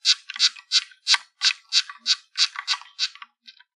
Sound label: chirps